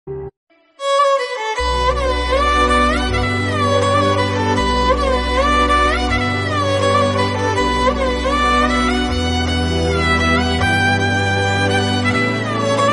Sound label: Telugu Love Breakup